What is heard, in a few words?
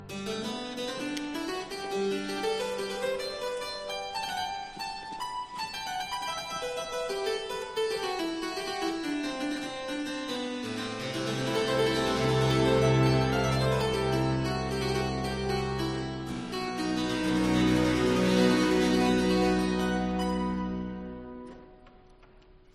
Adagio; Johann Sebastian Bach; Koncert Brandenburski nr 3 BWV 104